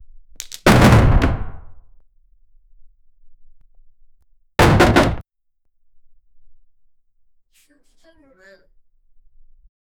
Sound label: in a fit of rage